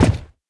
Shield steps; Folk Hero

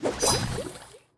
Goblin Blade Slice Poison

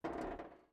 Mech Footstep Texture Metal

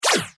Laser; Go, Kizi, Go!

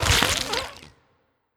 Big Death Gore; Legend of Keepers (gamerip) (2019)

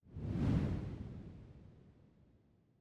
Switch Room; Switch Room4

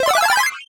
Special Photo Falling Blocks Place

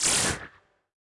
Baloon Damaged

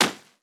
Pigeons; rabbids multiverse; iOS, macOS, Mobile

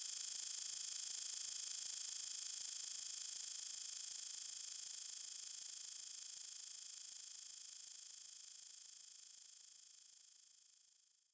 Music Frontend

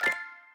Block Match; In Block Match05

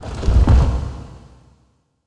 Jump Offscreen

